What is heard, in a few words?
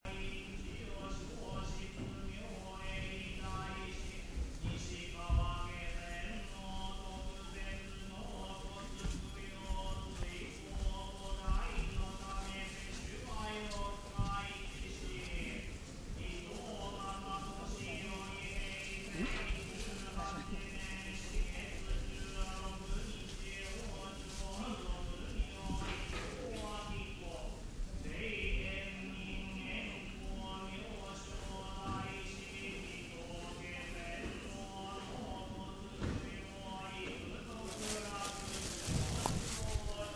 monks chant; chion-in